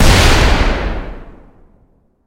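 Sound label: rolling booming explosion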